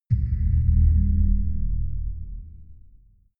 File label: far outer space shadow, hits impacts